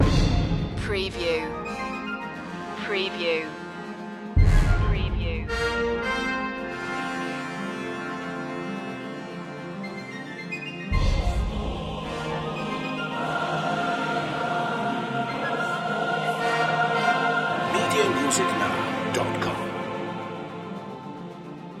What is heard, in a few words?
swift wings loop